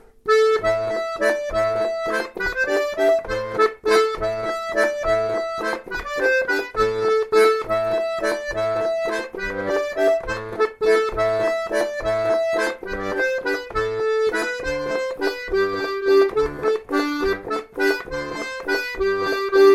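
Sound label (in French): bourree planiere, accordéon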